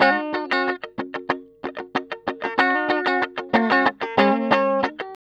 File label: TELE DUAL G 3, guitar; TELE DUO; Spectrasonics; Hans Zimmer Guitars VOL-1